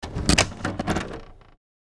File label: Small wooden door open; household